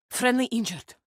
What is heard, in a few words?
injured